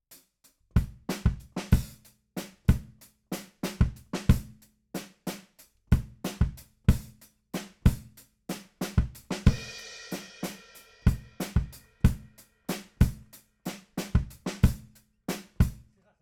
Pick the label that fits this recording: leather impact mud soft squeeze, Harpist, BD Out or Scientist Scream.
BD Out